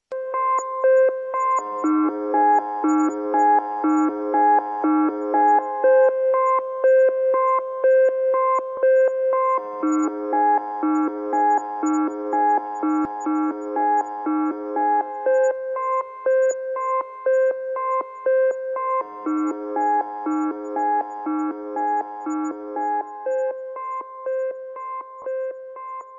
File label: thinking music